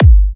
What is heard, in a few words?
G, kicks; markus hakala kicks